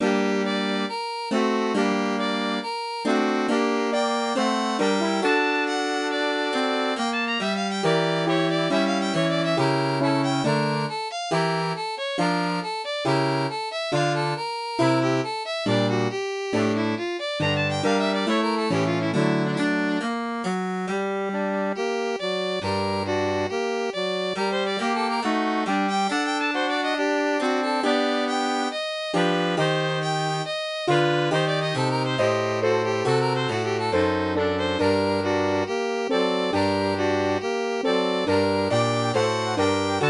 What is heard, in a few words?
MIDI